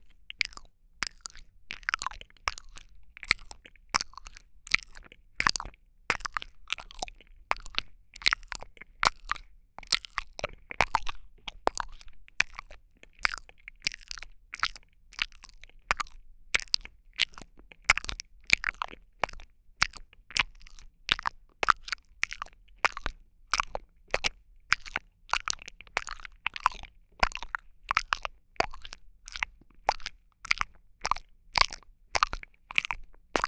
くちゅくちゅコース

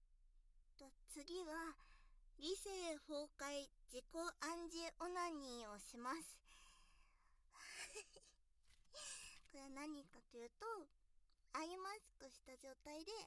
ガニ股で下品に絶叫連呼で、動画撮影しながら自己暗示オナニー アイマスク 乳首攻め マ●コ攻め クリチ●ポ攻め バイノーラルマイク収録

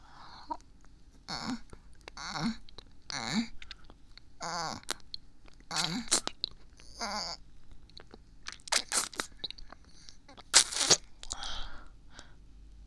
フェラ音(嗚咽しながら喉奥フェラ); 10秒間

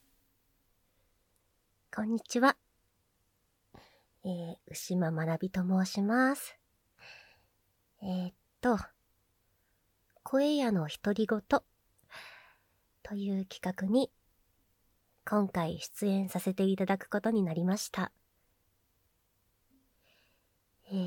プライベートオナニー実演; 声屋のひとりごと

